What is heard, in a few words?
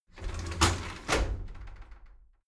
shower slide door inside open regular